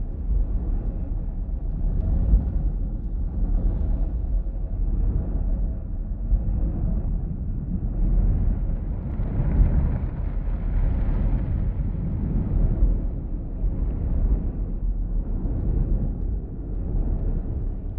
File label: colossus idle; aquatics